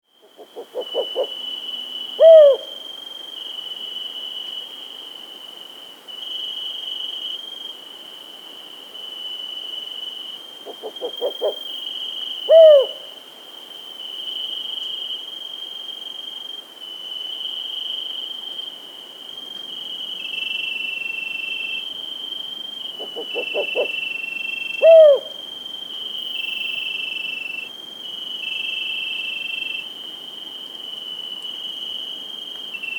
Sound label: Rufous-Backed Owl (Ciccaba Albitarsus), Andean Birds; S. America